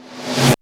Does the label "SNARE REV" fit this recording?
Yes